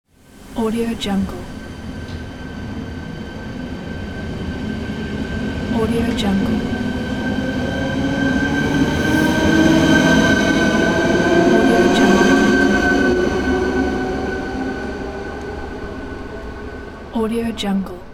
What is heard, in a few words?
train leaving the station